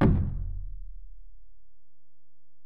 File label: Ac Perc, Drum Machines Alesis DM-PRO